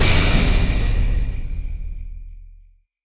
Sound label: jump; pets; winter spenser